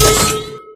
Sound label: ball dropped; source engine; tf; passtime; video games